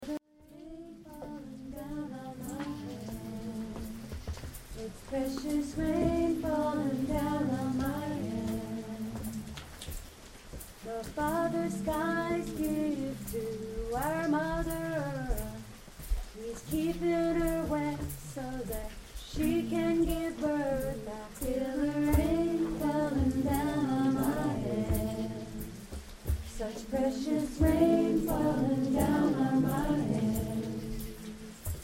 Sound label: Rain falling down